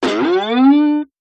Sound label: Boing Up; boings